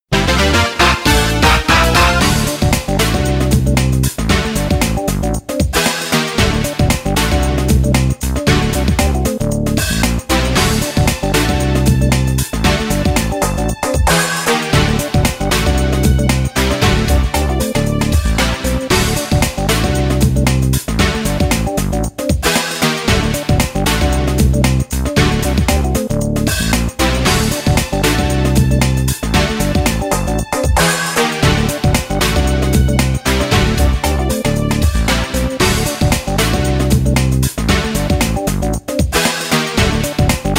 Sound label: ambiance - preparation